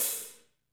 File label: HH HH